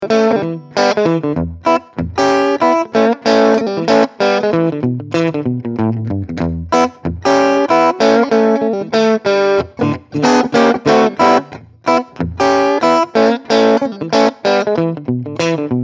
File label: Champion 600; fender